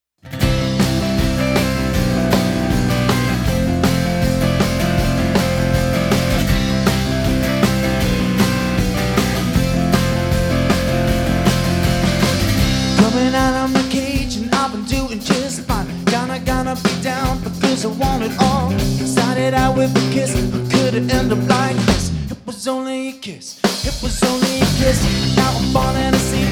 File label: Folk, Folk Rock Band; The Nouveau Folk Collective